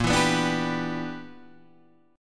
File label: E Minor 7th Bb; Em7/Bb; strummed